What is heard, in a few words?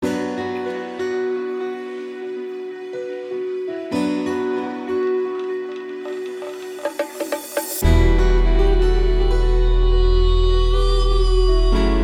Best Guitar